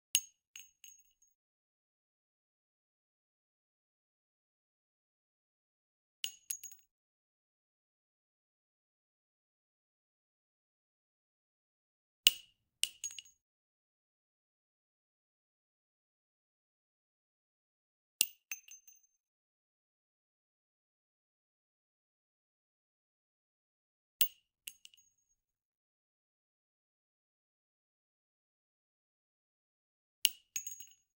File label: .357 Magnum Shell Drop On Concrete; Hollywood Edge; Heavily Armed